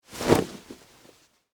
Suit Coat Shake, Foley, Clothes, Suit, Coat, Shake, Single; Dressing Sound Effects; Dressing (Sound Effects:Human); BlastWave